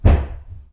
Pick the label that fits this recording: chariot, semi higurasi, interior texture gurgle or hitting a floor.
hitting a floor